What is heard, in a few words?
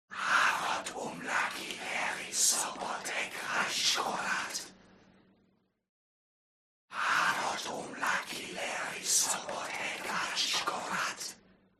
HUMAN CROWD Evoke Whisper; smartsound